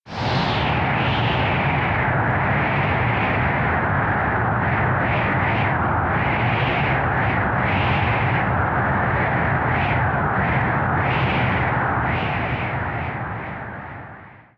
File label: wind